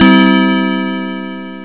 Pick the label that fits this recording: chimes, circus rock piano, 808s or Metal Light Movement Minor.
chimes